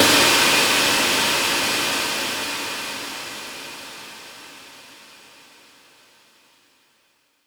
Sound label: Impact; Vengeance essential club sounds-3